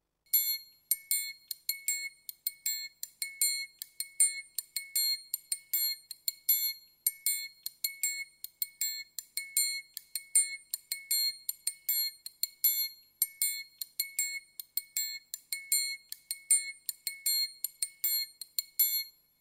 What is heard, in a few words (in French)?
Triangle